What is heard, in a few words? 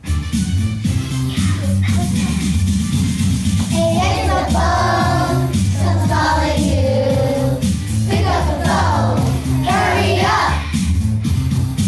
ringtone